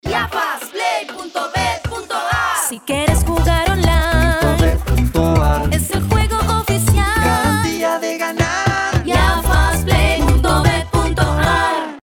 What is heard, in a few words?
jingle; IAFAS